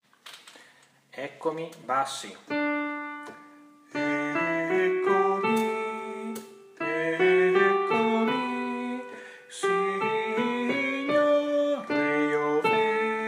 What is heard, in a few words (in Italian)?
Bassi; Eccomi